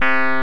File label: Electric Pianos